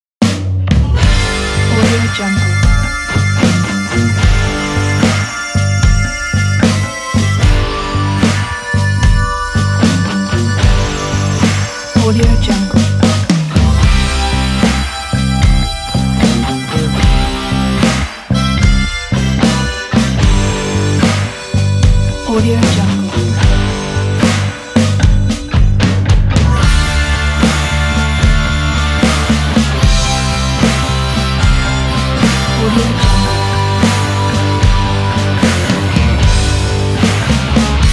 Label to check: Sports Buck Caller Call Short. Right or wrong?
Wrong